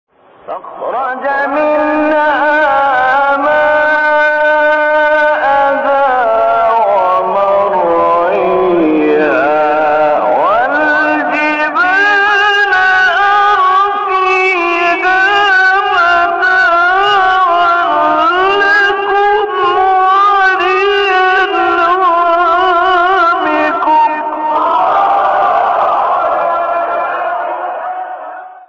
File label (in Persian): تلاوت زیبای آیه سوره نازعات; آیه 31 33; استاد محمد علی البنا